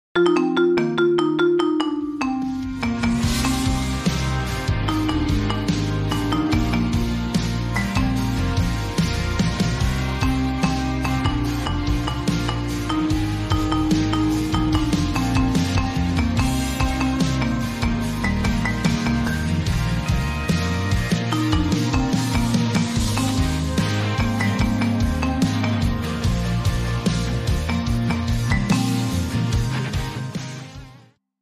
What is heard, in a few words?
Marimba